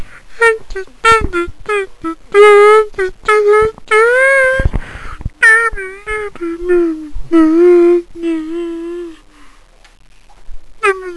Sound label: retard song